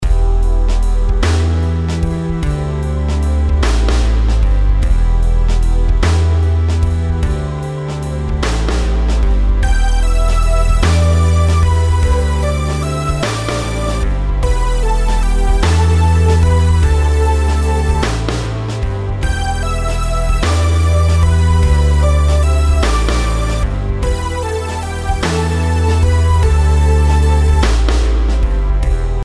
rhythms compound 8s

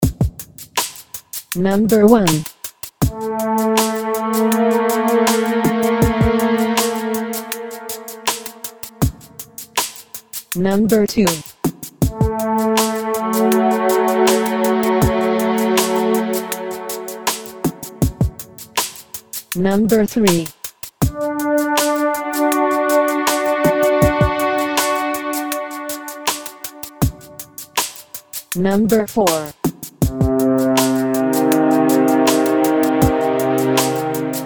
intervals m3 M3

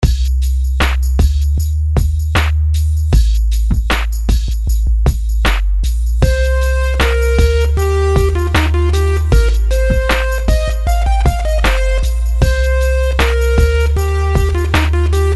rhythms simple 16ths

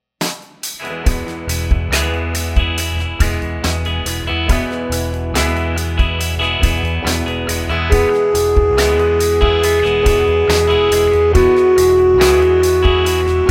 scale degree

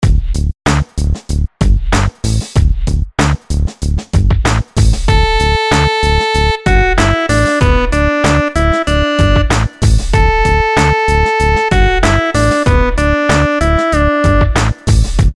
melodies simple syncopated, Dmaj; melody

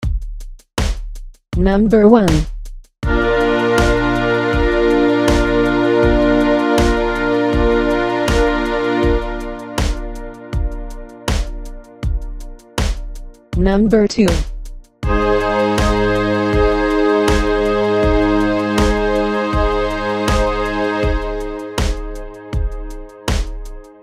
chord quality id; harmony

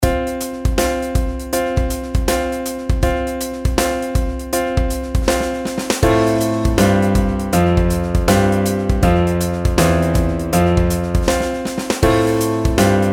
pitch patterns stepwise

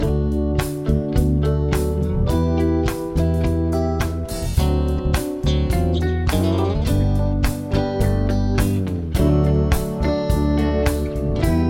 progs with applied chords

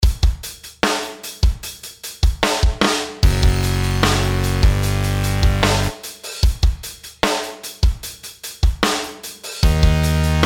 intervals; m2-M2

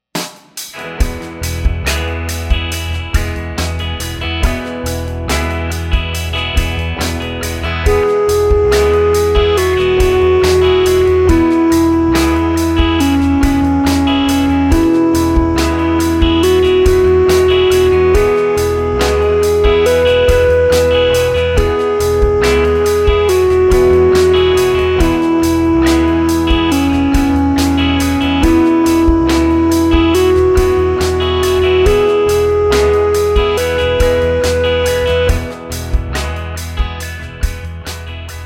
scale degree

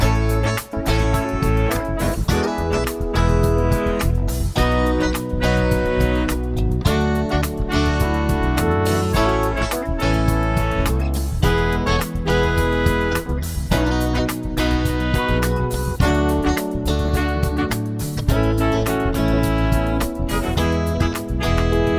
chord prog with mixture

